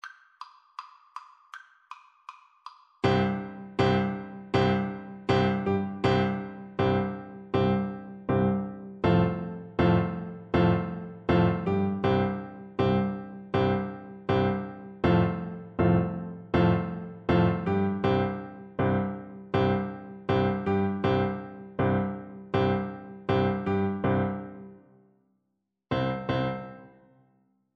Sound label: tchaikovsky 1812, oboe